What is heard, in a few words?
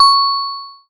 C#, BELL GONG, Synclav FM; Big Fish Audio